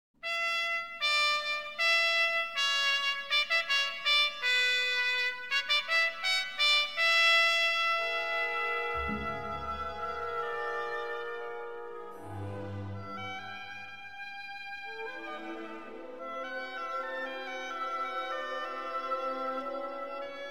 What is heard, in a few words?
Turkish symphonic music